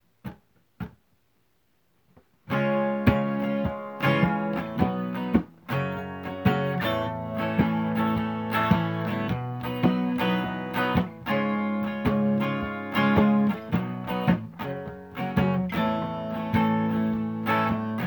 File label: acoustic